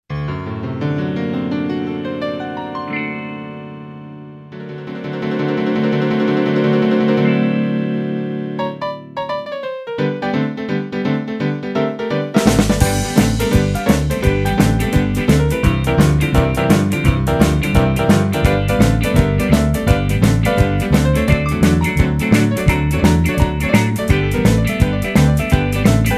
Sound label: Honky Tonk Train